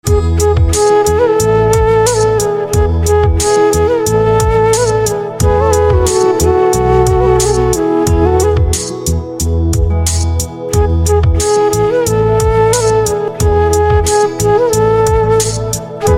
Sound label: Flute